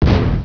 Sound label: Alien explosion; Bedlam 2 1.1.1; Bedlam Sounds